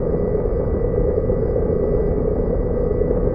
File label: warp engine; Earth and Beyond; EA Games